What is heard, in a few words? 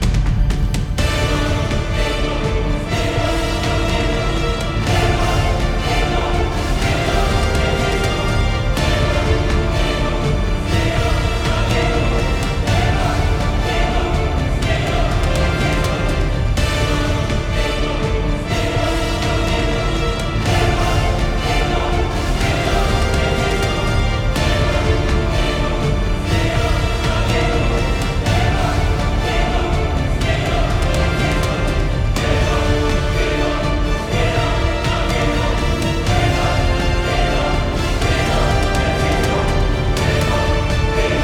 Ritual Final Loop; Zombie Zod